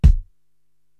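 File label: BD, bass drum, drum machine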